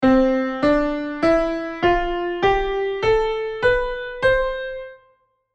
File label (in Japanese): Jazz 通常スケール; Jazz講座用DEMO